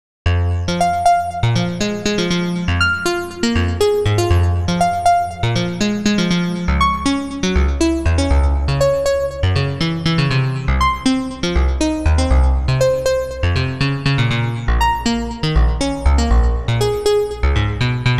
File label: capri arp; the space soundset